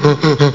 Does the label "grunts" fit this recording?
Yes